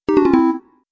cancel

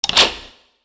door lock

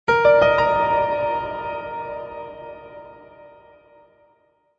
jingle

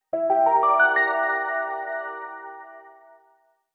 jingle

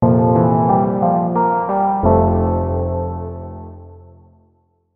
jingle

